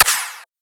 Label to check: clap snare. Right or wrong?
Right